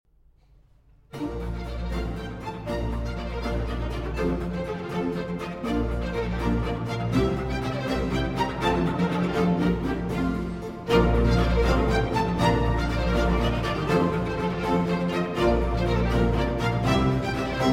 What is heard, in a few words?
Concerto for Strings in B Flat